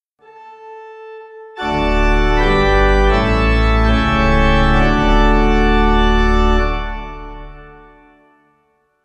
Thanksgiving Amen Pipe; Communion Service

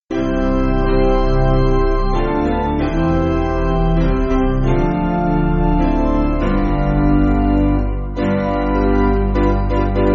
Piano

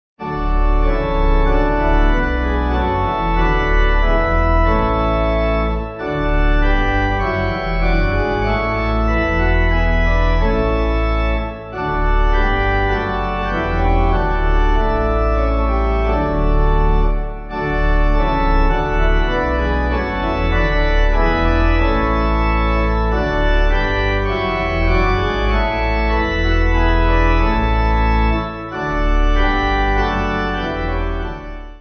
Pipe

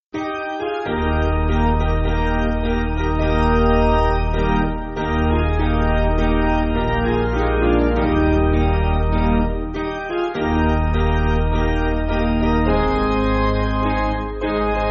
Piano, Backing Files for Churches; Sing It Out; Lowry; Eb

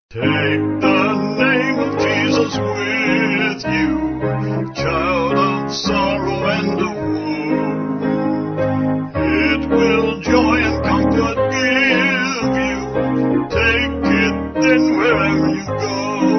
Vocals Piano; Band; 4/Ab; Take The Name Of Jesus

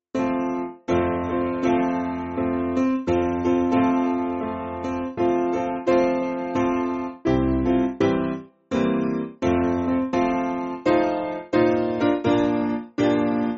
Piano, Free Music Backing Files for Churches; My Life My Love; Dunbar

